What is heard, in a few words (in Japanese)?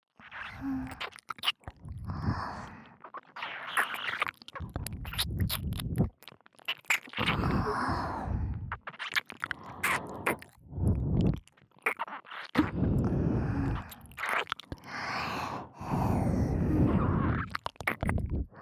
両耳舐めループ; 女子寮の管理人になったら精子が枯れそうな件!